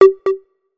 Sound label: soft echo